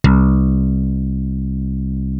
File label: SY77 BASS; East West; Global Sounds; DIGIBASS